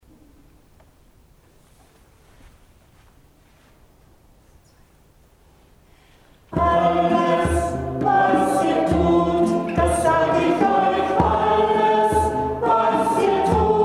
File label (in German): Alles was ihr tut Chor unisono